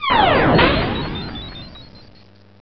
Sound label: warp